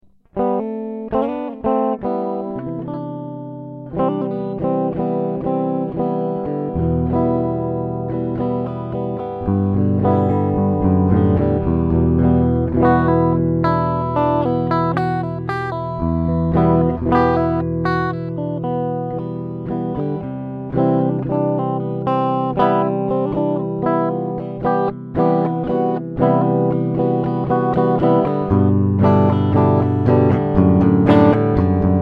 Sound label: guitar sound sample blend, bass guitar; aurelia